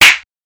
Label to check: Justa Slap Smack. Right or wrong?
Right